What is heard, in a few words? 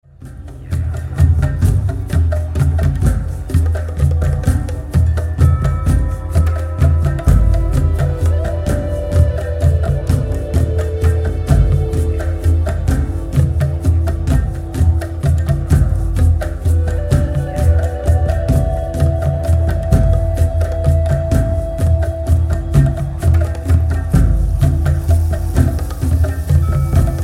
East Wind Drum Call